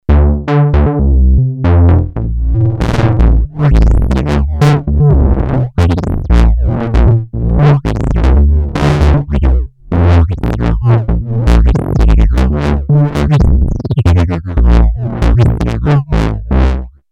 synth disturbed, Moog minitaur; Synth: disturbed freq; analog monophonic synthesizer; 2 VCO oscillator

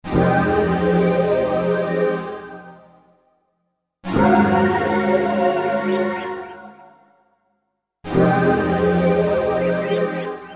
pad zero, Emu Planet Phatt; a Motorola MC 68000 CPU (mc68hc00fn10) system handles up to 32 voices of polyphony

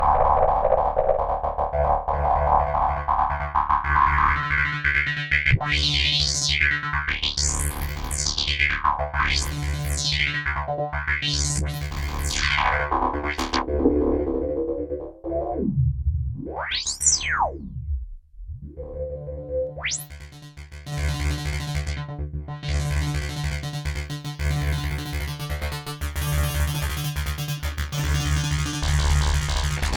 FX sequence, synth access virus

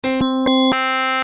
pcm, roland jd800; synth

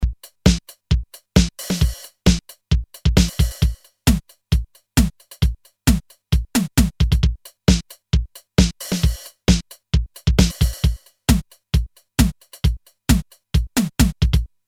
drum, Roland MT-32

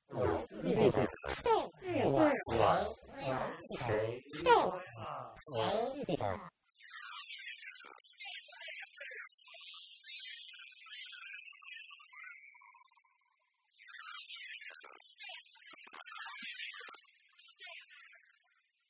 gem s2; synth

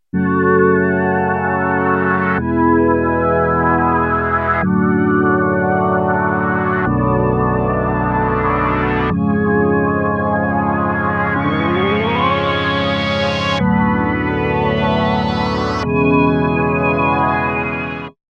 pad prog, Kawai sx240

